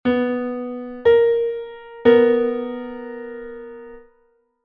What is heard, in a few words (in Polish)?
septyma h0 ais1; konsonans i dysonans